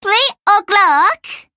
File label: clock